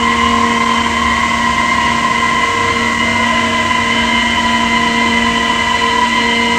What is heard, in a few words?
A#3 SPACE; Northstar; The Wizard VOL-1; TERROSTROIKA